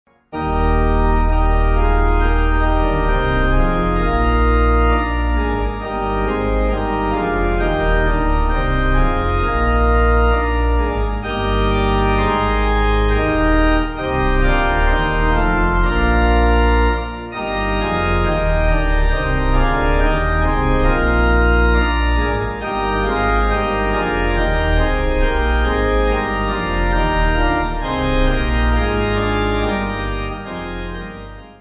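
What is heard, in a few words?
4v Eb Pipe, Pipe Organ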